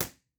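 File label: Live Room impulse response; Physics and Electronic Engineering Buildings; B-Format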